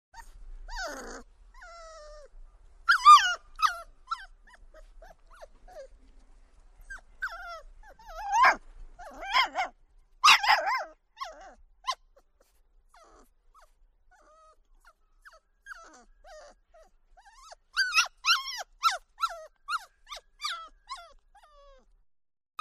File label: PUPPY YELP WHINE BARK; wildlife sounds